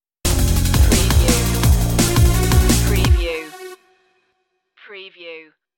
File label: vital beats stinger